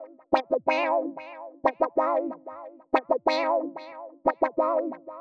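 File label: Pop Synth Wah, Fmin, 092 bpm; Pop Rock; Digidesign; Pro Tools; Pro Tools Essential Loops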